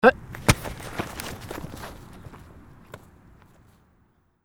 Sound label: football running catch